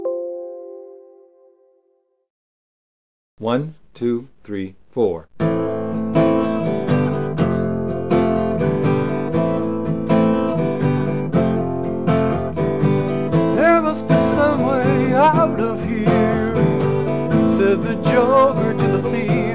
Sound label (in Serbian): Gitara